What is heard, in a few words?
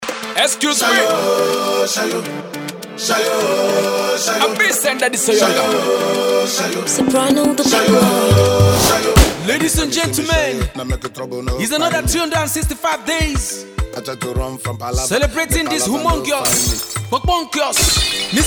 Afro Birthday Hype